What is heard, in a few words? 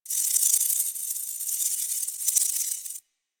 Animal Lure Generic Call Tech; Venus